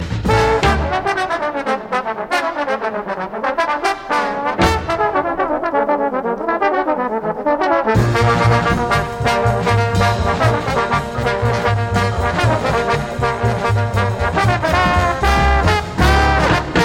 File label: solo na trombone; Jazz